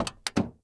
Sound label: door open, vehicles